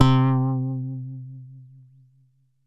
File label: ANALOG, BASS; Best Service; Hyper Dance